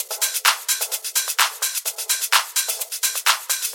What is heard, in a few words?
House Loop, 128 BPM; Vengeance Samples